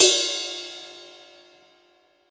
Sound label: ride cymbal sample B key